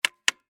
Nerf Pistol Turn, Foley, Pistol, Turn, Rotating, Barrel, Toy, Gun, Short; Toy Gun Sound Effects; BlastWave